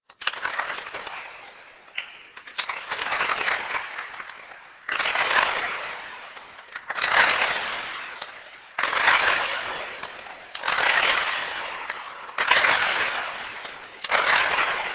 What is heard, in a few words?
applause